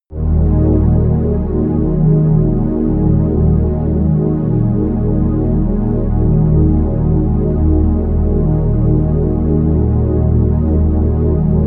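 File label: hallow air, pads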